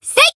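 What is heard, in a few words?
Voice attack, Japanese; shayne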